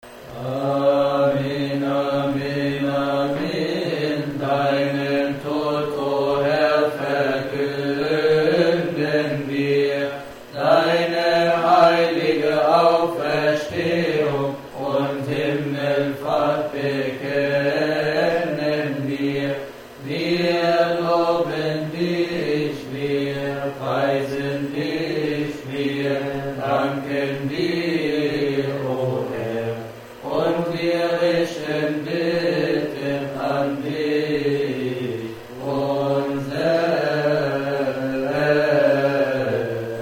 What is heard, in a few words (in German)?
amin amin amin (arabische melodie); Liturgie-Volk-Gebete Deutsch